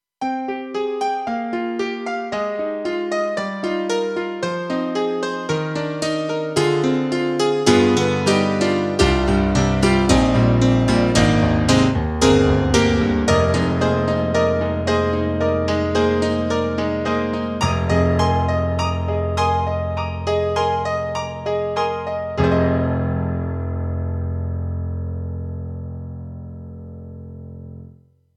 Rock Piano; KORG EXB-PCM08; TRITON-Rack

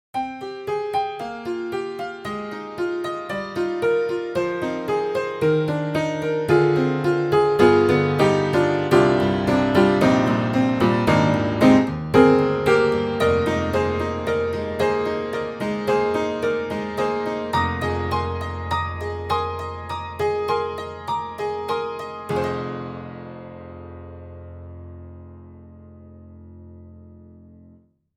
Upright Pianos Tack Piano Genuine Tack Piano; Synthogy; Ivory II

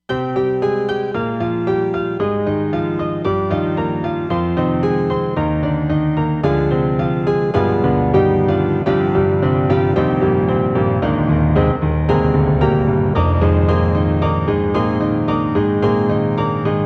LoFi Piano; Roland SD-50

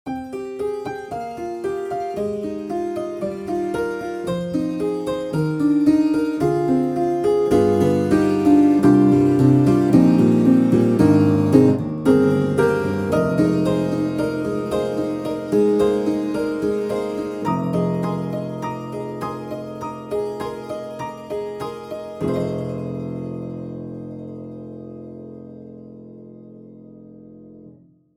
Tack Piano Tremolo; Spectrasonics; Keyscape; Wing

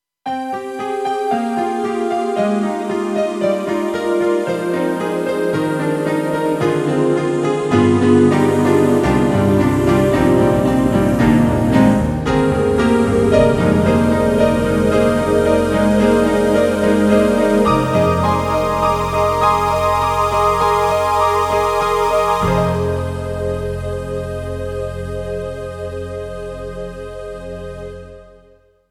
KORG M3 XPanded Piano Guitar Pad 2; M3 Version 1 Wave

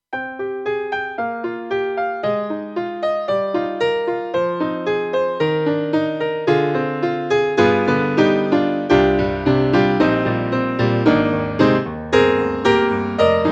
TIGHT PIANO; AKAI

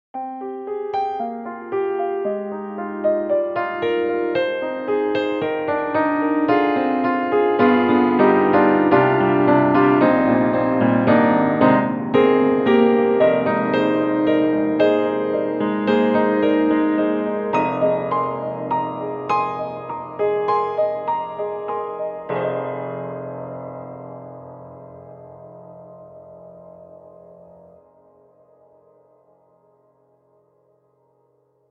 Vertikal Jazz Cathedral; VI ONE

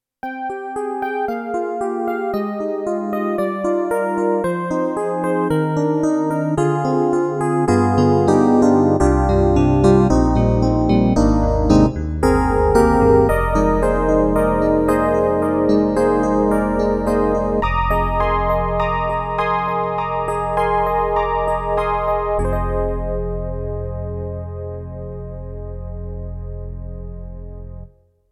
Yamaha MU90 DX Legend

